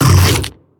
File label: biter roar big, creatures